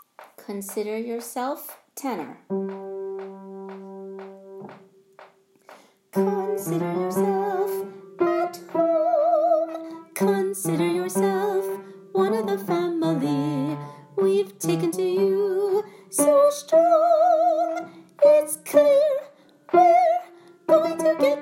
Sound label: Tenor; Broadway Shabbat